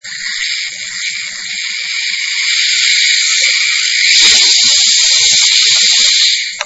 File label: Snake